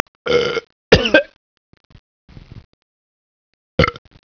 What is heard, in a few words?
BLOOPER